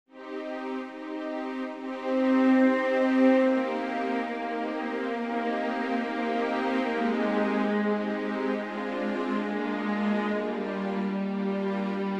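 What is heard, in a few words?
Vocalise Cm, Full Orchestra Accompaniment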